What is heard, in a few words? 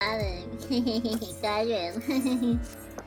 hehehe